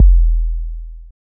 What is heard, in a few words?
sub, sub bass; Techno ID; single-drums; subbassdrums